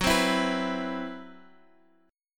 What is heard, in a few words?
C Major 7th Flat 5th F sharp, chord